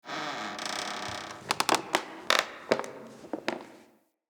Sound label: Station Misc, Ambience; Soul Plane; Orlick